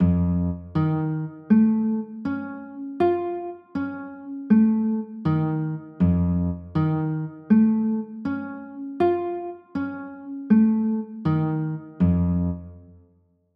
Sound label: f sharp 9; f 7 sharp 5